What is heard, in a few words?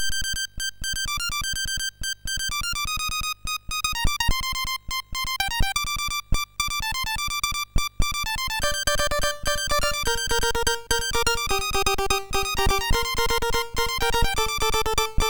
Loader sid MOS6581R3; Ocean G and W; hvsc